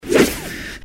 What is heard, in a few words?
swoosh